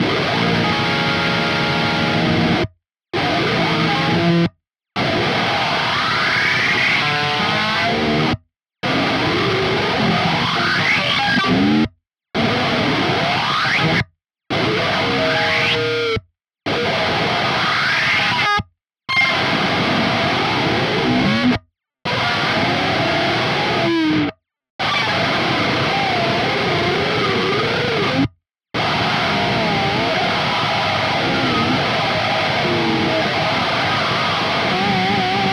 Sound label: Plastic Comb Scraping Strings, Overdriven; Rogue Waves; Metal Tensions